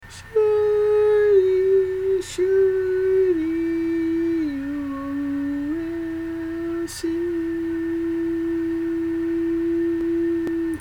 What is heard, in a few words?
barbershop tags